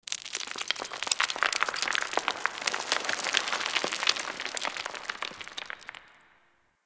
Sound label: Stone Crumble; Ambience; Dagath; Warframe; Clan Dojo